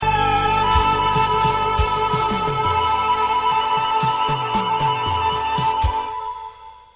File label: Shake High, diatonic